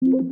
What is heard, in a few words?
correct